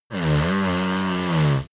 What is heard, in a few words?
yeti nose blow long